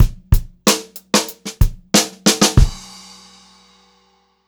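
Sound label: STRAIGHT FILL, Funk-Groove Drumloops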